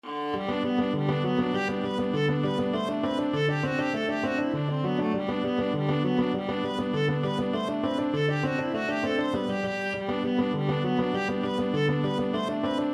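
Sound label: cincinnati hornpipe, viola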